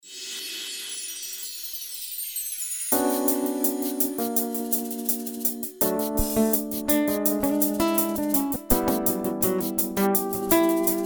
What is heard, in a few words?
Instrumental